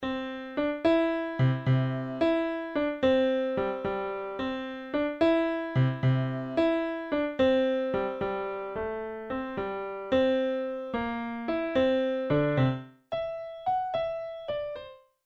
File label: Opening Theme Piano Midi; The Amazing World of Gumball